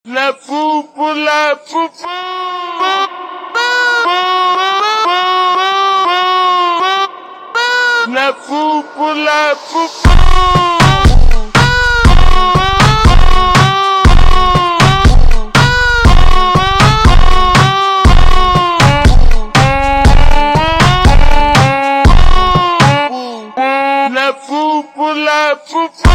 Reggaeton ultra Slowed

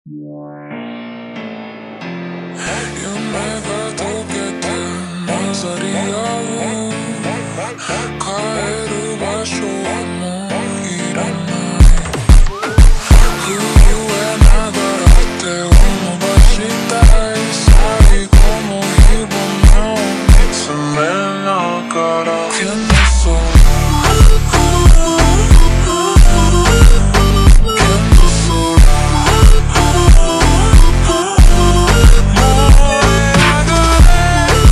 Montagem ultra Slowed

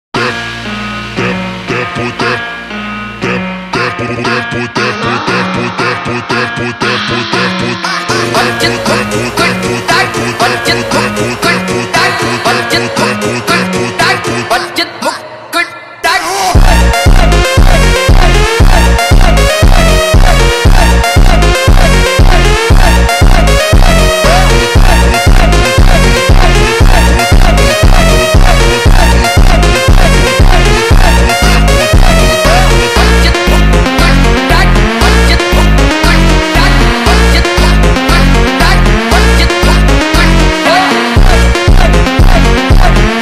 slowed

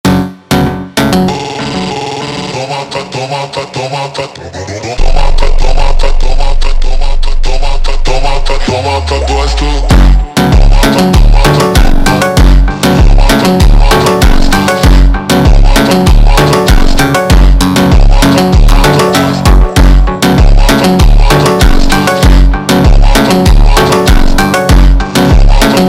Montagem Super Slowed

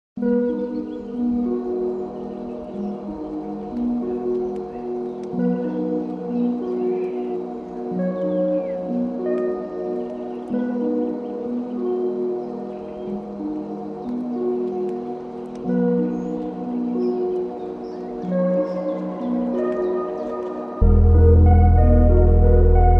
ambient

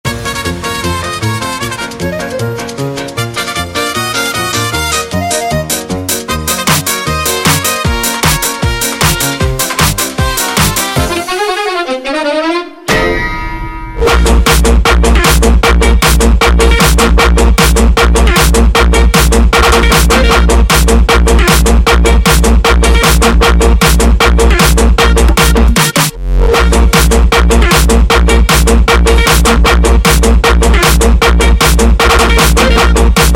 Hardtekk instrumental

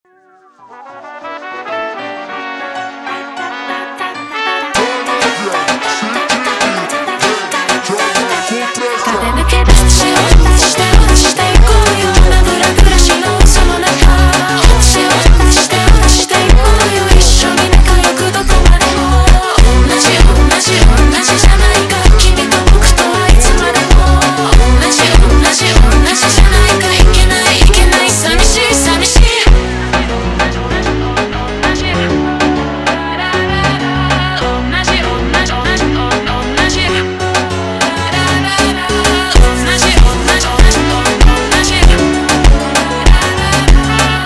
Funk slowed

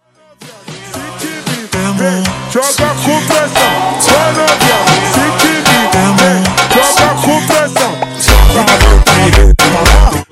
Funk super Slowed

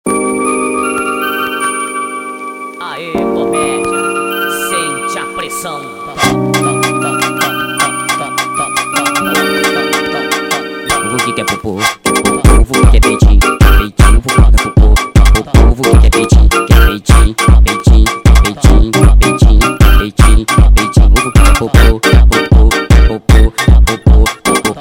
Funk sped Up

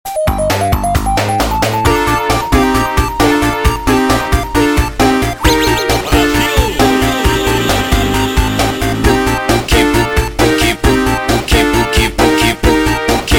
Funk slowed

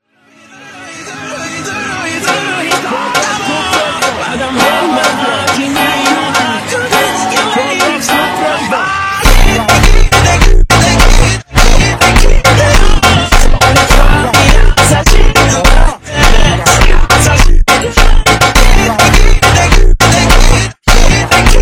FUNK Super Slowed

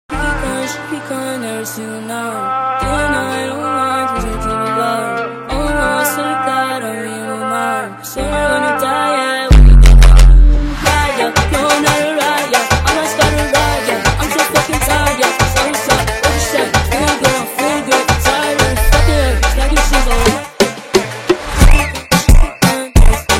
FUNK Slowed

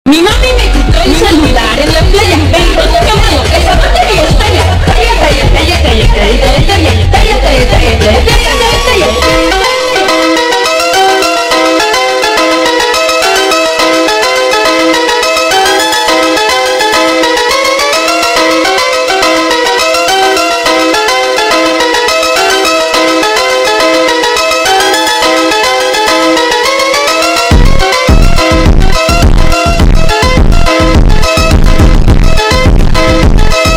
FUNK Slowed reverb

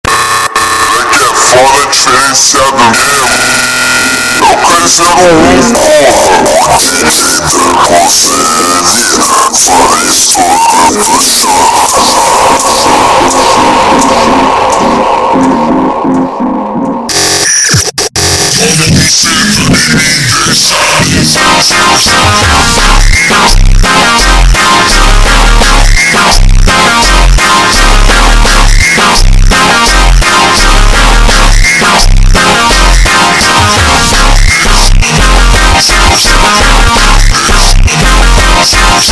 meowsynth Ultra Slowed

